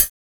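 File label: HH, hi-hat; X-Static Goldmine 1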